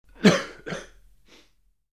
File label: Quick Male Coughs; breathing